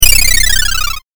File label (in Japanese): 8bit 詠唱